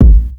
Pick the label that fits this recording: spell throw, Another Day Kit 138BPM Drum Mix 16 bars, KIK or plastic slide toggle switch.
KIK